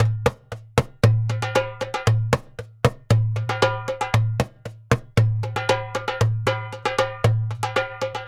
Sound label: Doumbek, Arabic; Sonic Foundry (Sony Creative Software); Continental Drift Aftershocks